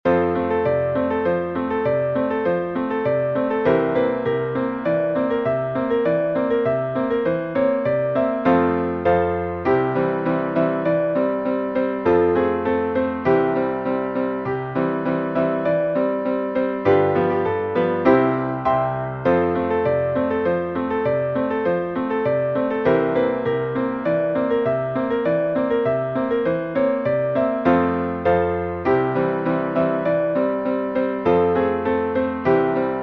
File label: Piano